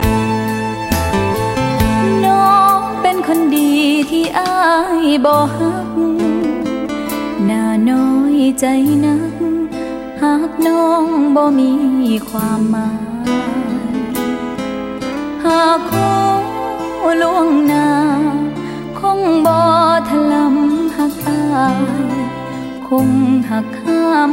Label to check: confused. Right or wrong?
Wrong